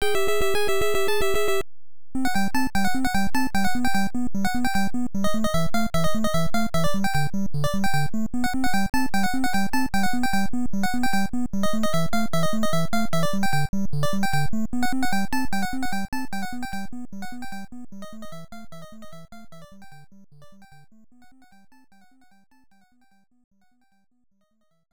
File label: Hurry Up Music; nes; Dig Dug II